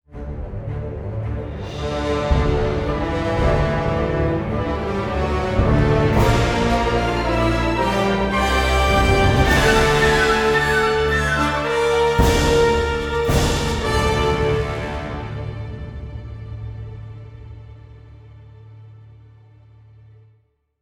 Hatchery Music

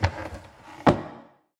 object drawer close

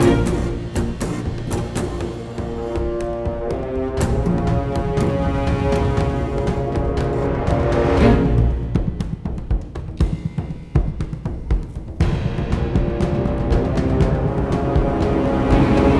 String; Assassin's Creed Unity Dead Kings

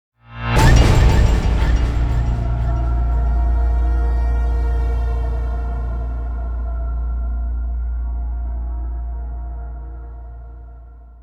Mission Failed; Metal Gear Solid Portable Ops; PSP

